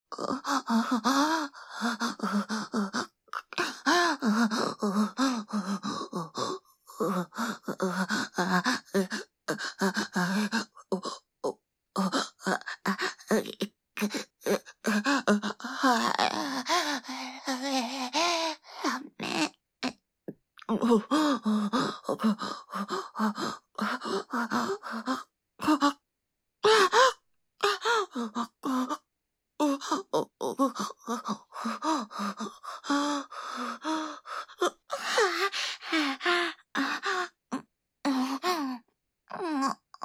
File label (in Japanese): オホ声（SEなし）娇喘